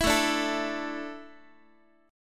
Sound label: A Suspended 4th down Guitar; Asus4; strummed; x,x,7,7,6,8